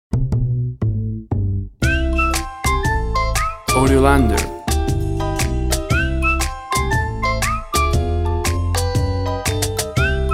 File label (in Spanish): SILBANDO RELAX